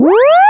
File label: whirly alarm; pyledriver